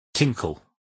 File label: tinkle